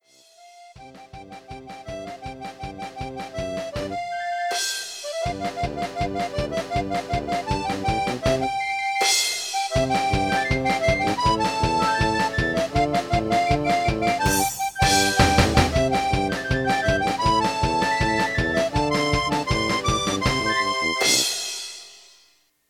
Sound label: Outro Music